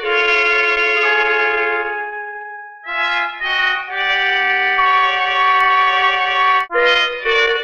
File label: horns, Sad